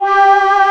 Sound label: VO G4, ANA VOX, SYNTHESIZER; AKAI S6000 CD-ROM - Volume 1